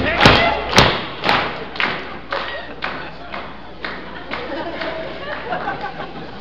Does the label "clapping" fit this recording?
Yes